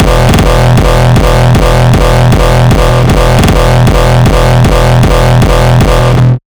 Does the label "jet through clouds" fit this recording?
No